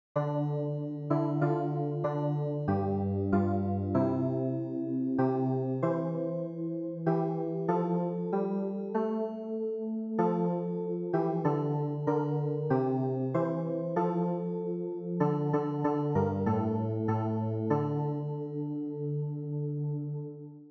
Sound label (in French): KYRIE CLAVIER; St Jean